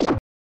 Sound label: Hit